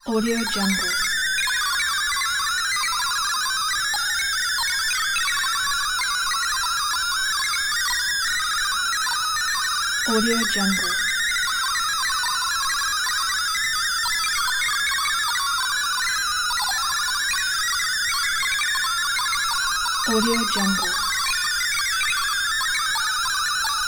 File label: spaceship computers beeping; soundjay